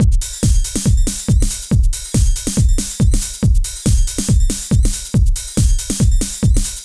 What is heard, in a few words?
Groove